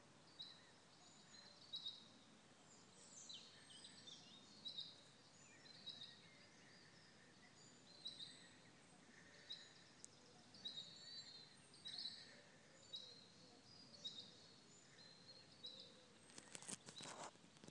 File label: first cuckoo at uppark